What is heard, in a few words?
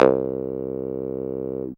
Acid Bass, Dance Synths